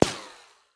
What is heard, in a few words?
Bullet Wizz, ricochet; tacz-tweak-pack; taczmechanics